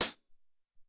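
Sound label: Closed Hat, Alesis SR-18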